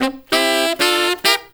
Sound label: SAX, W.AFR.SAX; Spectrasonics; Heart of Africa